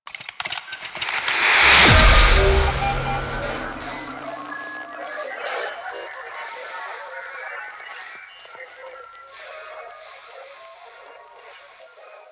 Title Card Start; Warframe; Nineteen Ninety Nine; Quests

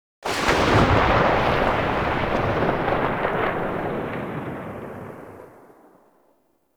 Tail; Assist; Warframe; Nineteen Ninety Nine; Quests; Quincy

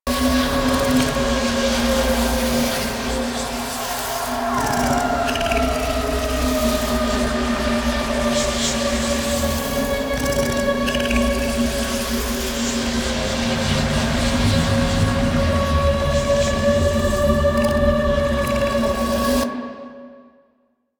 Void Ooze Loop; Ambience; Props; Warframe; The New War; Zariman; Quests

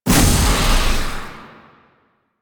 Survival Orb Destroy; Warframe; Zariman

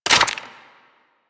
Rifle Reload; Warframe; Weapons; Infested; Inf Quanta

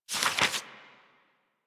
Pickup Paper; Warframe; Cephalon Weave

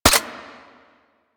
Shot Mech; Warframe; Afuris

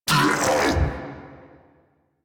hand bomber pain; Warframe

